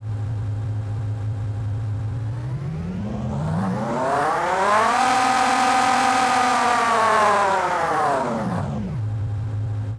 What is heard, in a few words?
sports, engine sound